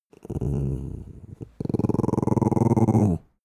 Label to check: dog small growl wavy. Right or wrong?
Right